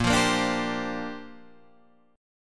Bb 6th Add 9th Bb Guitar Open D

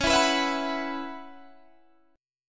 Db Minor 11th Db Guitar Half Step Down; strummed

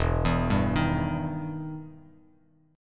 E Minor Major 7th E down Bass Standard; EmM7 arpeggiated

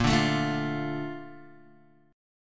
Bb Major 7th, Bb, down, Guitar, DADGAD

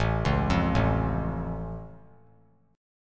A Augmented A down; arpeggiated; JGuitar

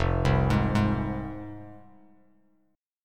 F Minor F down Bass Standard; Fm arpeggiated; JGuitar

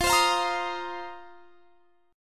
F 7th Suspended 4th F down; strummed

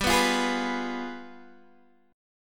G 7th Flat 9th, Guitar, Standard; strummed; JGuitar

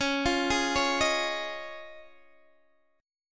C sharp Major Add 9th, Guitar, DADGAD; C#add9; arpeggiated

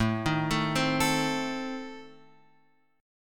A Diminished, Guitar Open F; x,4,3,4,3,4; arpeggiated; JGuitar

